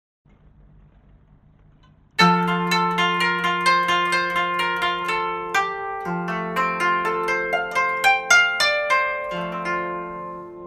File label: koto